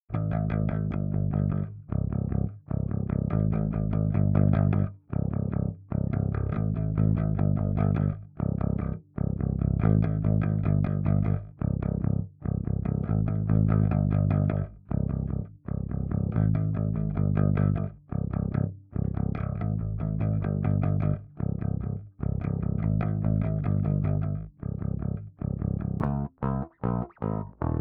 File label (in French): novatron bass sans effet